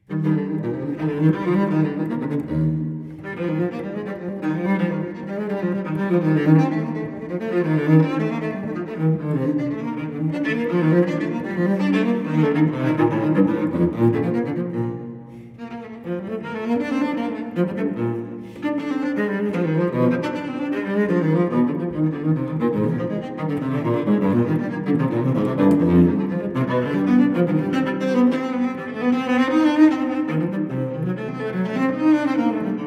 Cello Suite No. 4 in E flat major Gigue; BWV1010; Johann Sebastian Bach

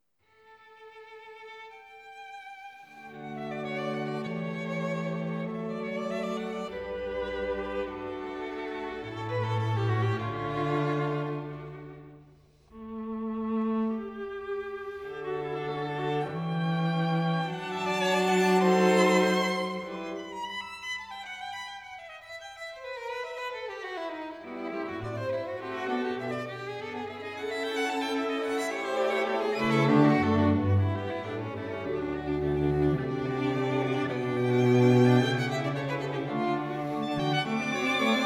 String Quartet in D Major Allegro; String Quartet No. 3; Op. 18 No. 3; Ludwig van Beethoven; Beethoven - String Quartets Nos. 1-16

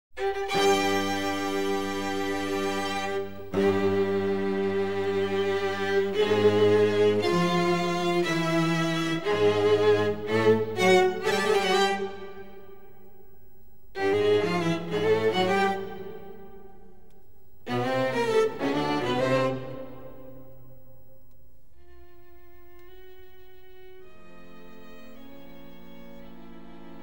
Fugue for string quartet in B fla

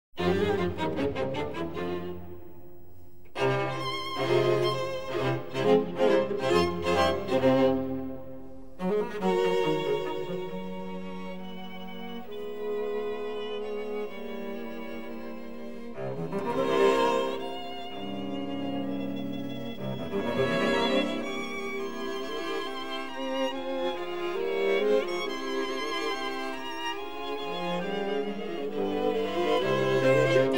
String Quartet in F minor

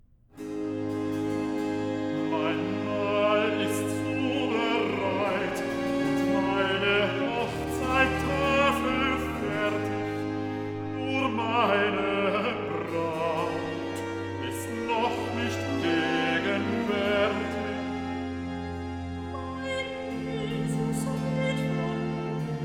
Recitative (Bass); Ich geh und suche mit Verlangen, BWV 49; Mein Mahl ist zubereit (Bass, Soprano)

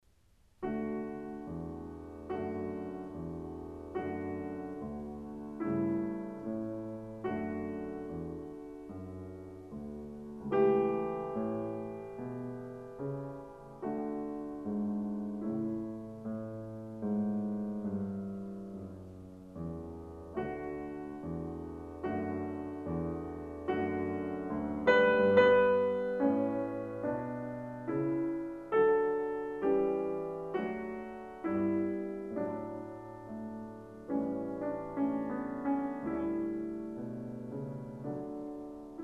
Piano Sonata No. 2 in A major; Wilhelm Kempff; Beethoven; Piano Sonatas

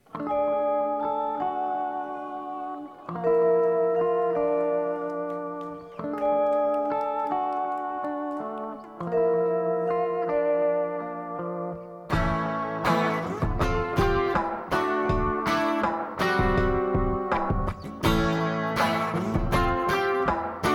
Mellotron Scratch

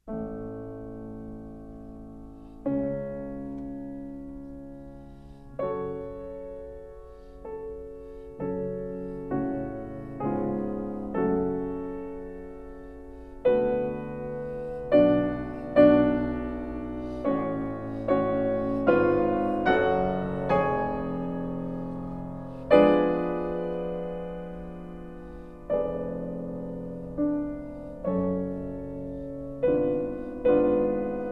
Piano Sonata in B flat

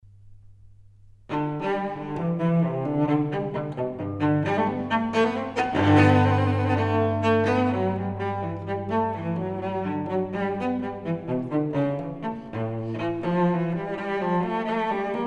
Suite for solo cello No. 1 in G; Bach; Cello Suites

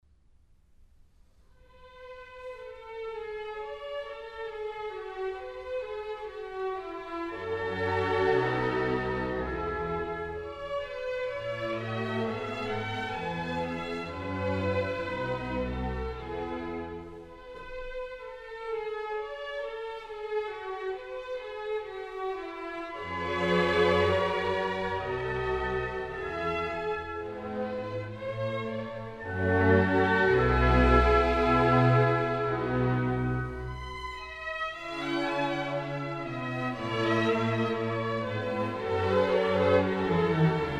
Violin Concerto No. 5 in A major; Mozart Violin Concertos 3 & 5

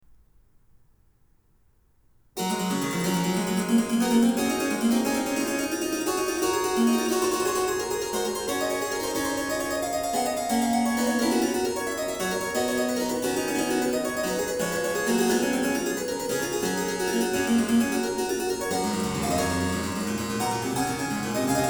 Harpsichord

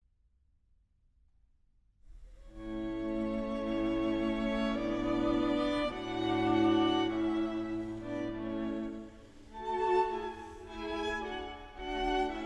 String Quartet No 21 in D Andante; Wolfgang Amadeus Mozart; Mozart String Quartets

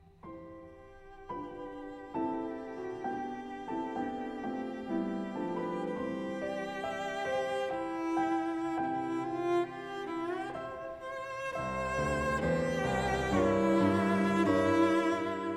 Sonata for Cello and Piano No.4 in C, Tempo d'a

